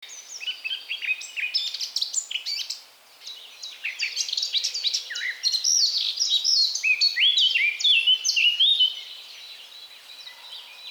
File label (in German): Naturklang der Singvoegel - Der Klang des Waldes; Entspannung fuer Koerper und Geist